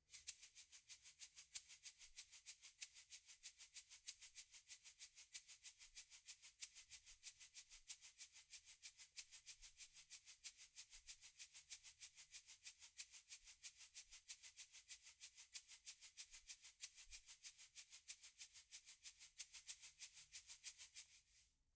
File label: Shaker, 94bpm